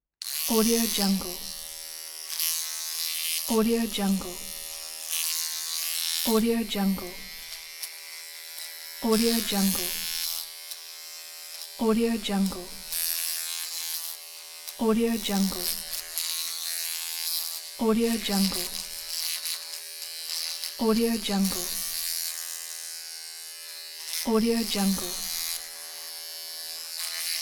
hair trimmer

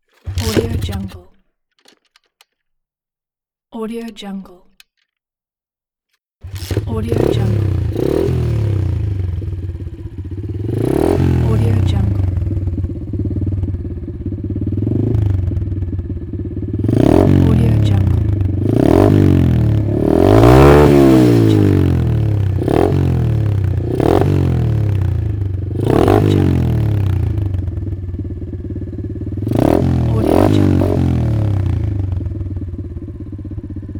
motocross bike; kaelv

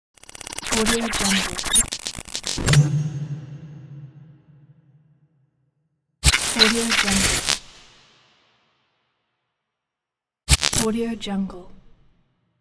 glitch logo sfx

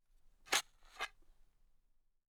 dirt impacts

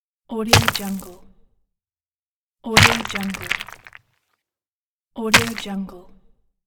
stone hit

